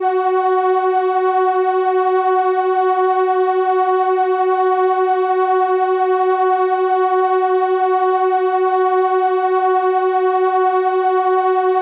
violin F#4, ARP Solina

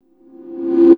91 DRM SND, Pure Electro

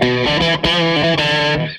Tele Guitar Loop; Sonic Foundry (Sony Creative Software) - Six-String Orchestra

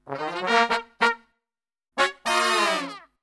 POP EBM, Brass; Best Service; Brass Super Section; POP LICK

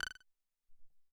Sci Fi

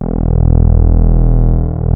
PROPHET 5; Hollywood Edge - Giorgio Moroder Rare Synthesizer Collection

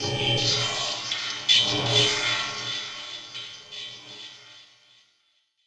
X MACH, MACHINES, Production Effects; Best Service - XXL Production Effects

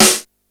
snare, Yamaha RY-8

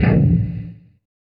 CPU STEP; Distored Reality

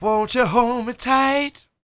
SING W, Vocal Planet Blues & Soul, BLUESY; ILIO

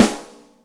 AmbiRim, Yamaha DTXpress, Acoustic Snare

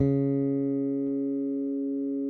SPC SUS M F C#3, Bass; Sampleheads; Will Lee Bass Library

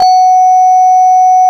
Korg CX3, F#5; Back In Time Records; Korg Universe VOL-1

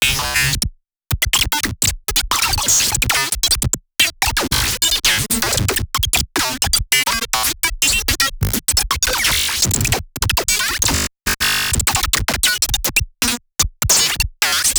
glitch loop

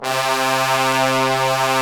BRS TBNS, Bone Sec ff; Roland LCDP06 Brass Sections

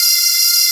KOR HP F4; Analogon

